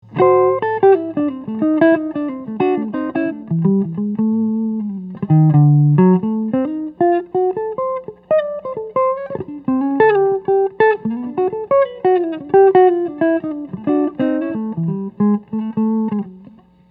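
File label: Gibson ES-350 P with Amp, electric archtop guitar; 1947; all maple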